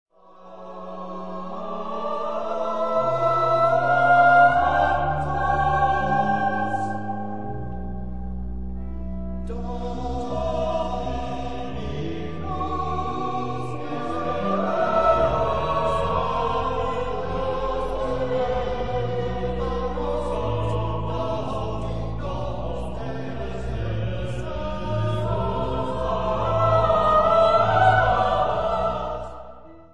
Missa Brevis Sanctus; Wuorinen

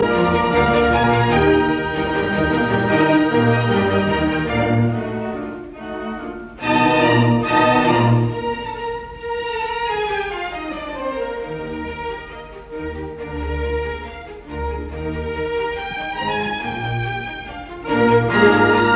Piano Concerto in E flat major Allegro; Mozart; Jeunehomme

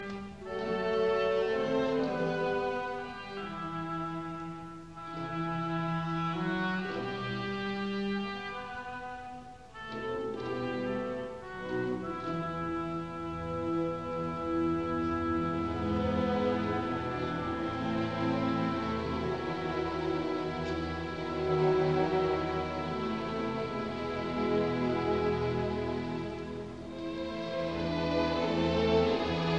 Symphony in D minor Romanze Ziemlich lang; Schumann; Op 120; revised version 1851; Ziemlich langsam

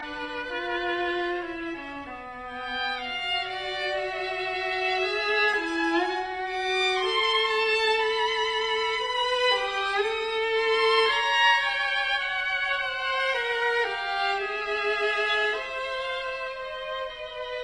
String Quartet, Fugato Sehr langsame Viertel; Hindemith; Op 22

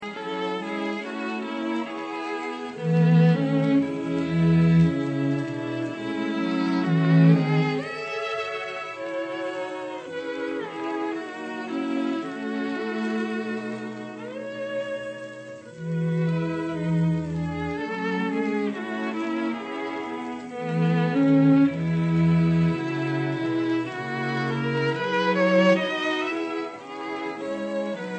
arr. for string quartet; Jeanie with the Light Brown Hair

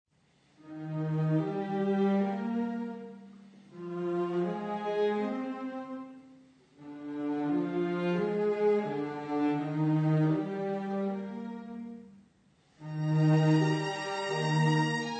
Viola Quintet in B flat, Adagio

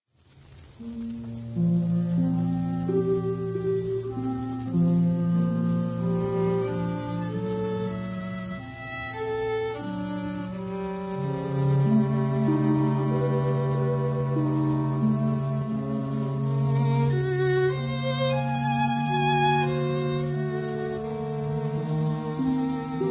Violin Concerto; Berg

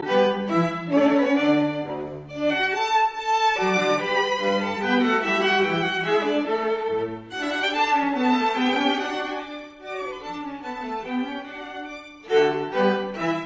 String Quartet Menuet; in D major; Haydn; Op 2 No 5; Hob III11

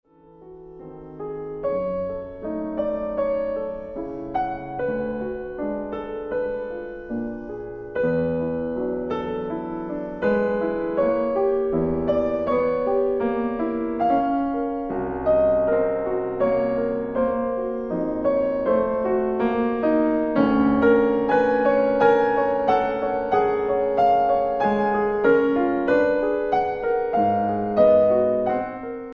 Preludes for piano, Andante

